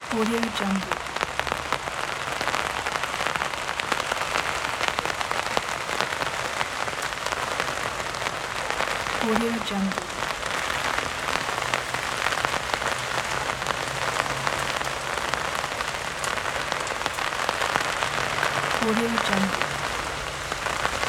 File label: rain on umbrella